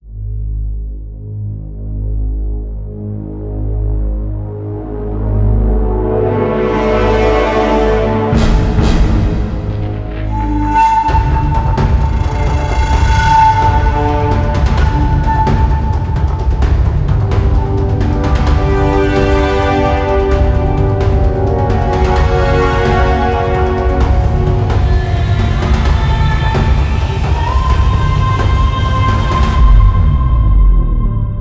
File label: ethnic hybrid